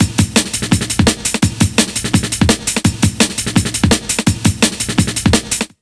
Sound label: LOOP, Explosive Jungle; USB Soundscan vol.05; AKAI